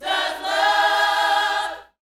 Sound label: YOUTH CH PHRASE, Vocal Planet Gospel